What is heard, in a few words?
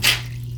Splat